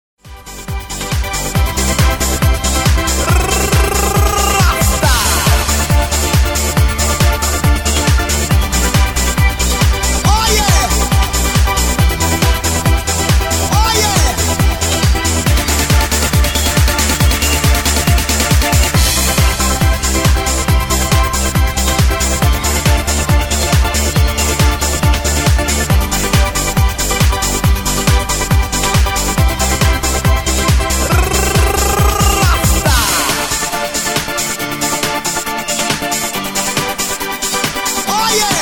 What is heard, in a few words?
TECHNO RASTA